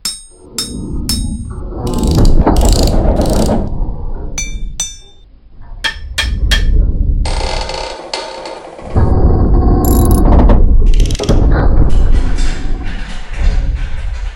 patching kit loop; Tools; Barotrauma; EK modern

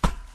metal, Footsteps; Barotrauma

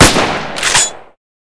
shotgun; EK modern; Barotrauma

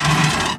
metal run, Footsteps; Thalassophobia Restored

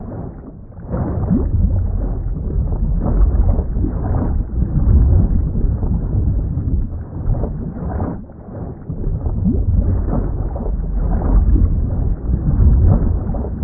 Hydrophone Movement Small; Soundproof Walls 2.0

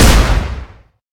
beast fire; Weapons; EK modern; Barotrauma

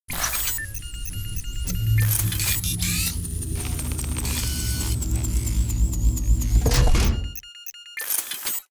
fabricator; Barotrauma; Subsurface; Fabricators

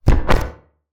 footstep; Barotrauma; Barotraumatic; Crook

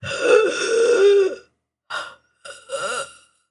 female wheeze; Barotrauma; Real Sonar

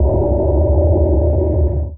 sonar Tail Suit Close Shuttle; Barotrauma; Real Sonar

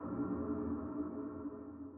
sonar Tail Air Far Shuttle; Barotrauma; Real Sonar

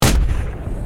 WEAPONS chain Gun Shot; Barotrauma; EK modern

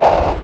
crawler damage; Barotraumatic; Cthulhu; InsanityMonsters; Hallucination1

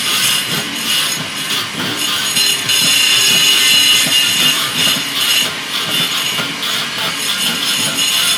chainsaw Metal; Tools; Barotrauma; Barotraumatic

